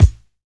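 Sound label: lofi kick; Drums In Your FACE!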